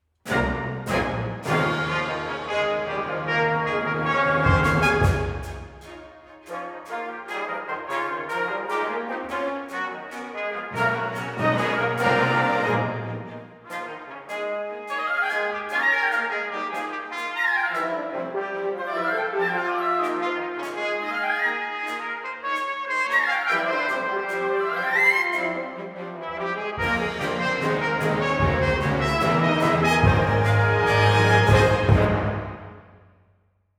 fanfares momentum fanfare

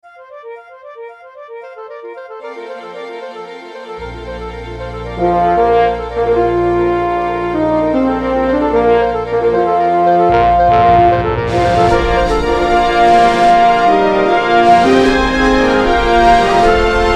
Shimmer Fanfare

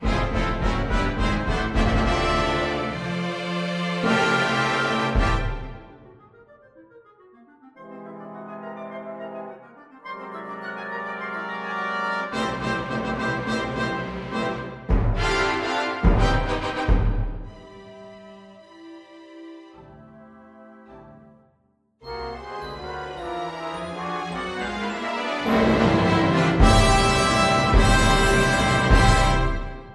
Fanfare

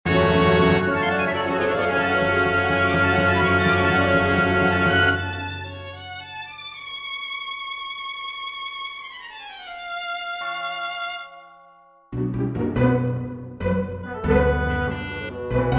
Fanfare Villainous

